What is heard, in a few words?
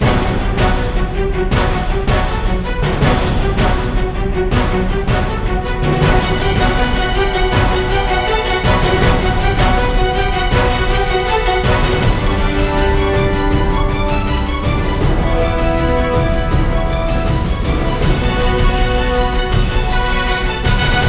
Boss Epic Fight Loop; Stage-6-Boss2